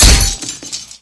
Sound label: Vehicles; unreal development kit; Scorpion